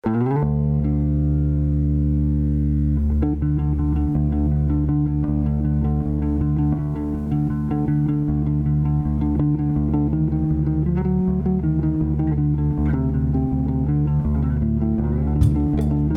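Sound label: Guitars Bass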